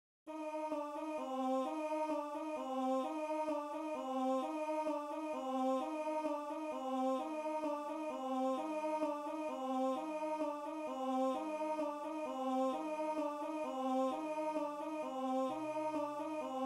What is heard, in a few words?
bells, ttbb